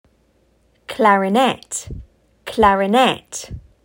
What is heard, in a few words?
Clarinet